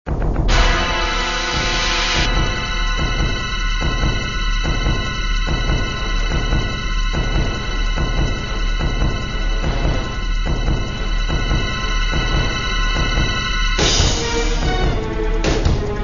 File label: Scary Music